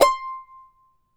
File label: charango C5; Spectrasonics; Hans Zimmer Guitars VOL-2